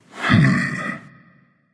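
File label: non verbal thinking, voice; cernunnos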